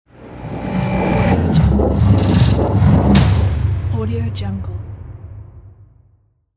cinematic hit

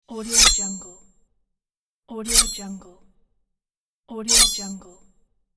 sword; juicycorp

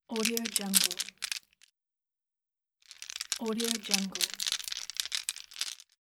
necklace with large stones

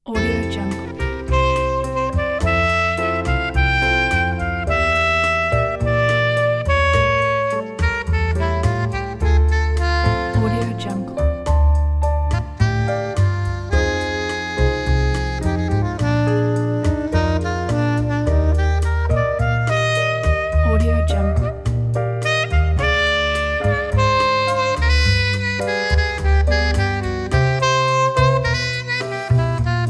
quiet slow bossa nova

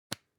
object hygiene lipstick open soft

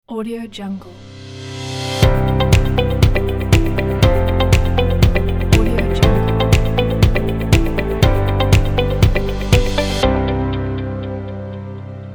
uplifting corporate logo

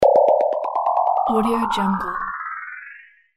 cartoon effect

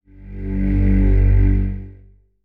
pulsing tone

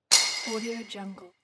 shattering glass or ceramic; fascinatedsound

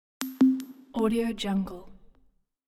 suspense game moment

